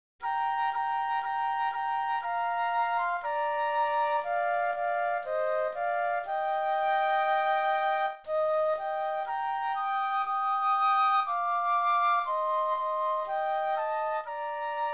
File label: Flute Duet